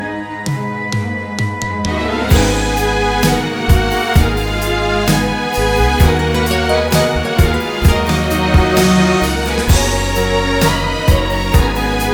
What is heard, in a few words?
drums factory; ozon